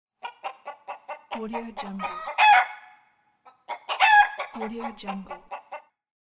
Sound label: chicken